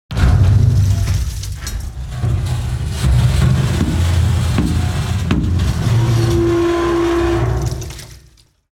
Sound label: dry hard sheet metal movement; Alexander Kopeikin; Black Metal